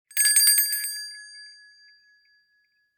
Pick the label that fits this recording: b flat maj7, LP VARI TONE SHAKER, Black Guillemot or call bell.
call bell